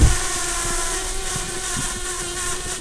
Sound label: bumblebees, Pascuorum queens